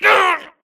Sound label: grunt death quick; Titanfall 2